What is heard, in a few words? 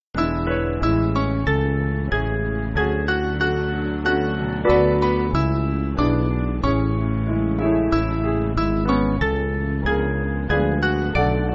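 Piano, Backing Files for Churches; St Lucius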